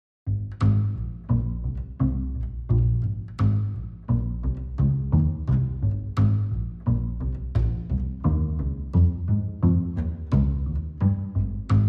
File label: Bass